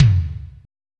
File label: BD; E-Lab; X Static Goldmine 1